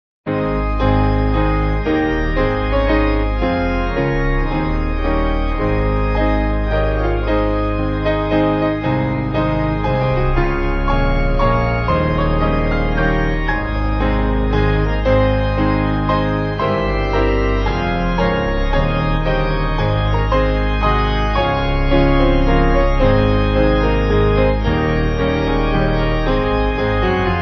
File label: Piano Organ; Organ/Piano Duet; 4/Ab; All Hail The Power; Diadem